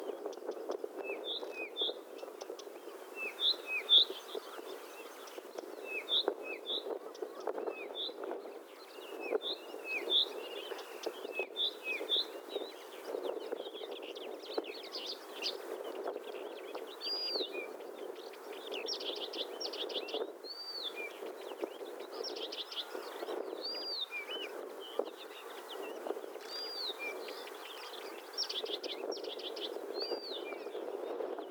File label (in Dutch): Struikrietzanger, acrocephalus dumetorum; graszanger; Struikrietzanger-site